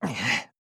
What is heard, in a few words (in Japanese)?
攻撃